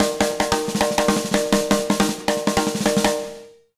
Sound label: 160 B BEAT, Jazz Latin Drumloops